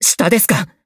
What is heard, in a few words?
Taking Damage; BBCF; Hibiki Kohaku